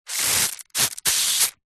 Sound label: furniture polish can spray; household